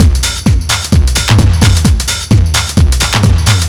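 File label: drum loop 130, left; House; Best Service ProSamples vol.10 - House; AKAI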